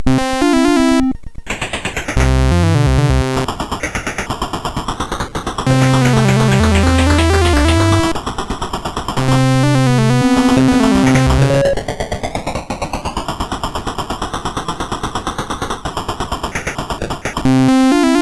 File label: Train, CSG8580R5; hvsc